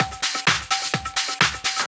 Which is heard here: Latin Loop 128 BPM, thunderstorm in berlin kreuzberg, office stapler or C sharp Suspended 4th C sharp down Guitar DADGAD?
Latin Loop 128 BPM